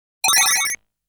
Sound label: Sound when you take the item